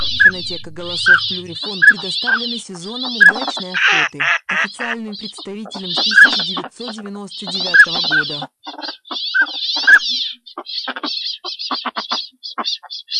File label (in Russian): Шилохвость